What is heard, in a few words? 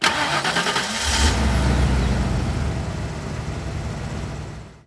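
car start; auto A start; Mafie 1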